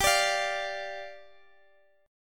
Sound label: D Minor Double Flat 5th, G